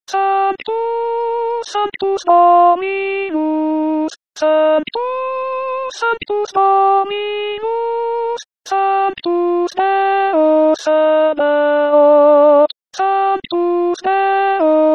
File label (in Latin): Santus Missa pro pace ALTI